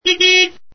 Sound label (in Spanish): claxon coche